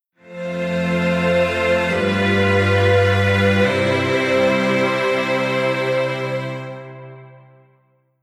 Strings Poly Hamo

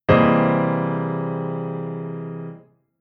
Chord Poly; Jissen

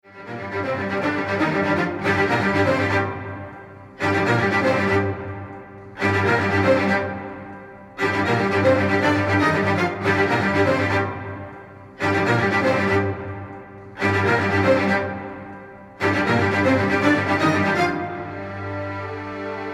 Strings Kizami Strings Only

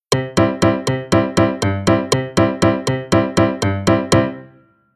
Rhythm

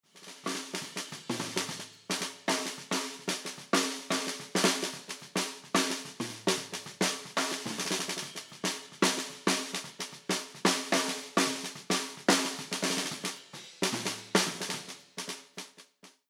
Drums SD EQ On

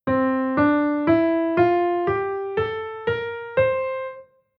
Chord mode C Mixolydian

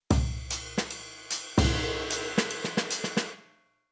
Rhythm Modern Jazz

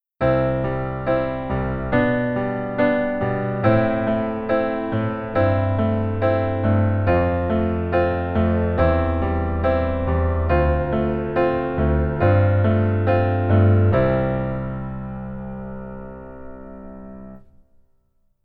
Keyboard Chord

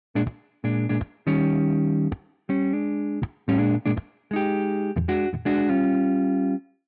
guitar pattern 2 gt

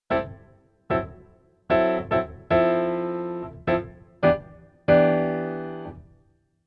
Keyboard Pf Jazz Pf

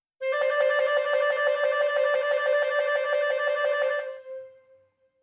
trill

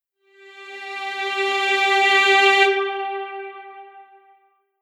Strings Exp Cresc

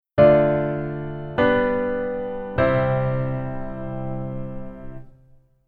Keyboard Voicing Hi

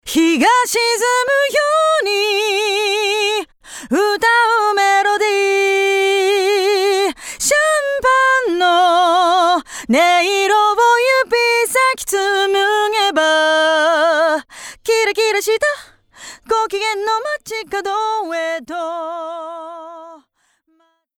Mix Vo LA3A On

